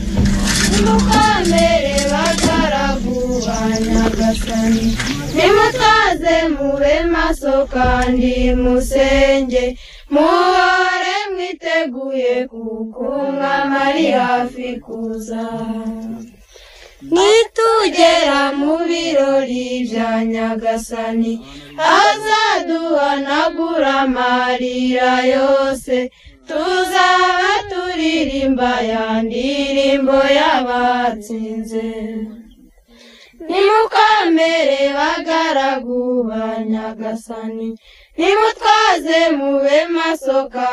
Chants rwandais

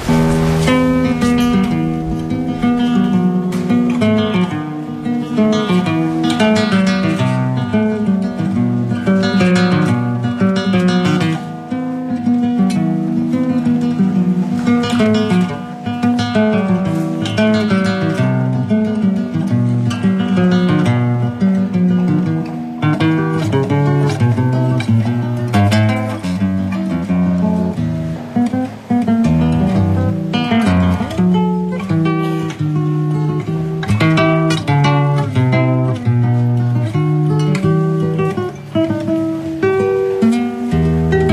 Musique argentine

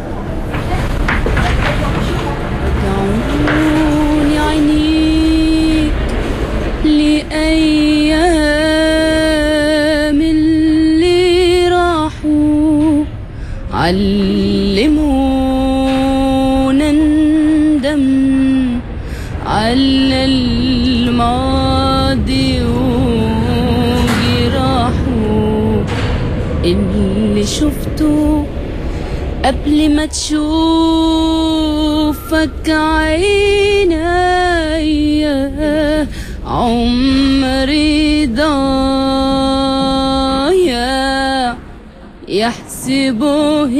Chant arabe

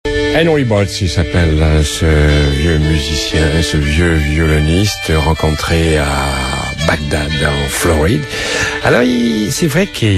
Chanteur Country

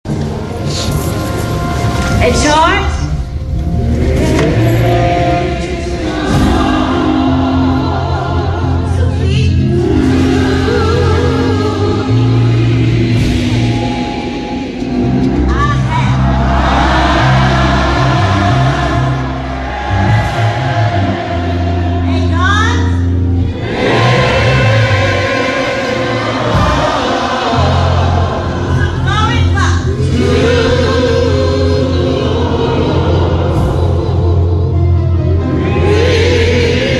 Obseques US Marine chants

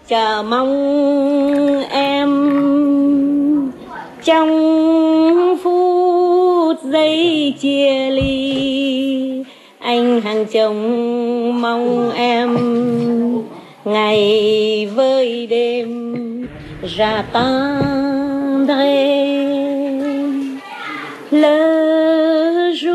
Chanson franco vietnamienne

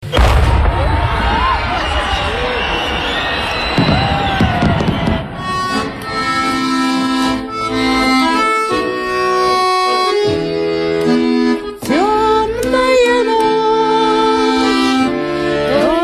Chant russe

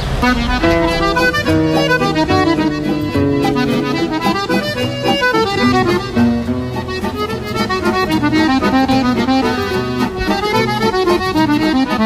Valse

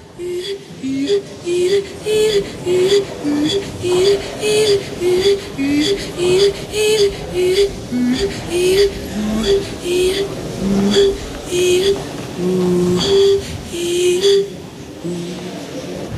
Chant

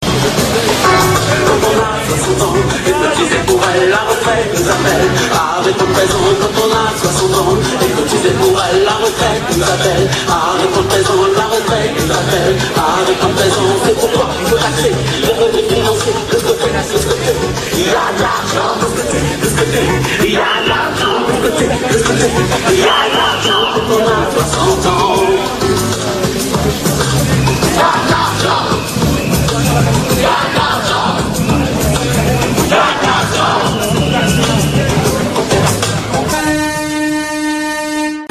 Manif retraites chanson

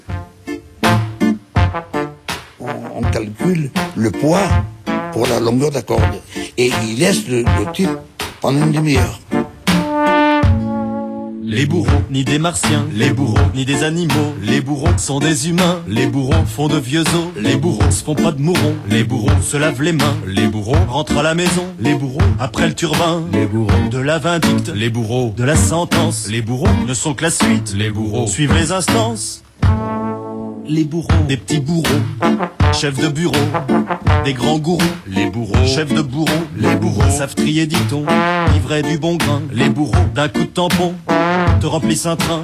Chanson

